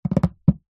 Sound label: plastic tub empty tap base hand drum rhythm; foley